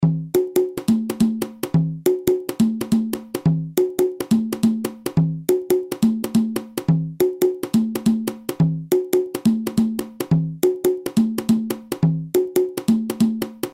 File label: guarapachangueo cajon